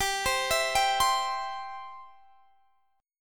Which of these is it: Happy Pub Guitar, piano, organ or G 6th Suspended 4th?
G 6th Suspended 4th